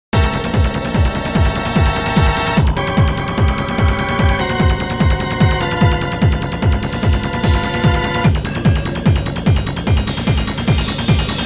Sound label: Battle Theme; Metroid Prime Pinball OST